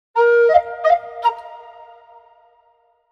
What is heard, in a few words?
Wisp Rescued Jingle; Bayonetta Origins